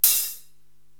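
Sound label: Hi Hat Open, Korg TR-Rack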